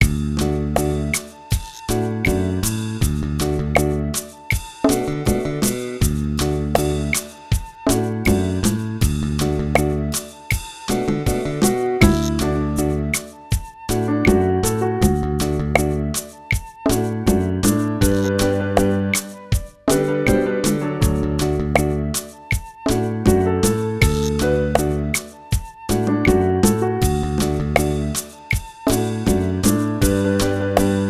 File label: ACCOMP